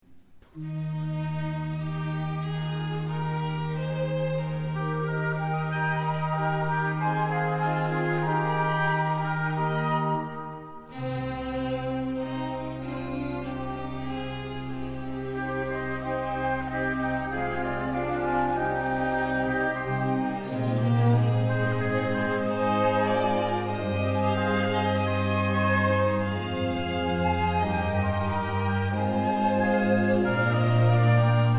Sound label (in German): Chor und Orchester; Kantate zum Advent; Zweite Kantate Maria; Gott der All